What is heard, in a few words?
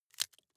wood chip small break creak